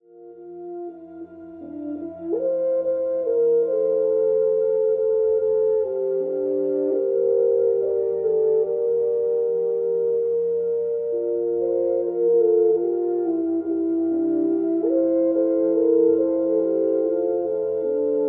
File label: Horn Quartet; Basden